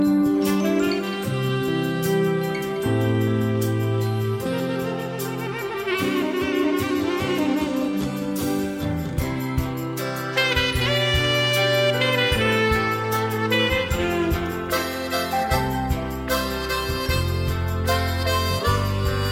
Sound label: saxophon; Electronica